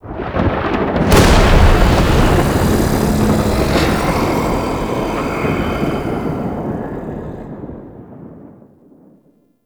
Murmur Exterior Thunder Clap G; Warframe; Ambience; Duviri

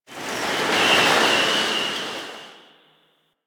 Destruction Space Wind; Ambience; Warframe; Corpus Railjack

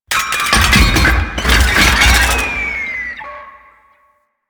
Necramech Ranged Death Fall; Warframe

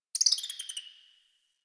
Tree Critters Happy Calm, Ambience; Warframe; Duviri

